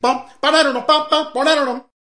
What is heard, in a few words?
bebop lick, male singing